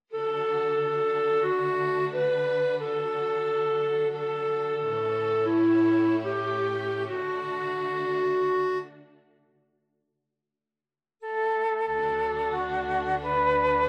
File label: Woodwind and Strings